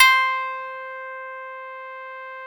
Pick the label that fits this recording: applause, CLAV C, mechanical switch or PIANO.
CLAV C